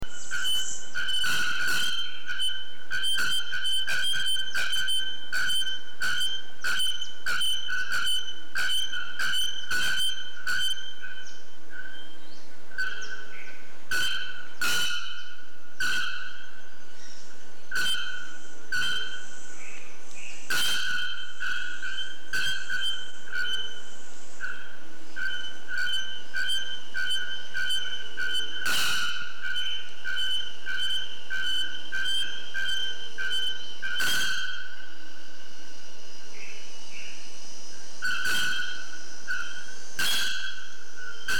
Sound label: Pájaro Campana, Procnias nudicollis, Bare-throated Bellbird; Cotingidae; cantos